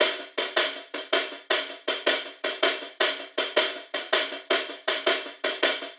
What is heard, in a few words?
Industrial 160 Hat Loop; Drums; Loops; Drum Construction Kits; Ghosthack; Drum Mega Bundle; Drum Hero 5